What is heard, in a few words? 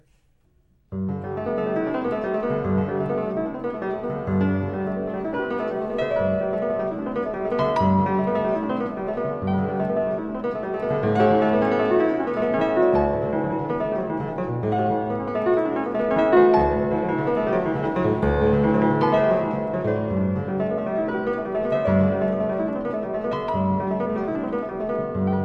Preludes Op 28 - No 3 in G major spoken, Piano; Frederic Chopin